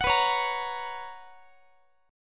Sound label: B Major 7th Suspended 2nd Guitar, chord; strummed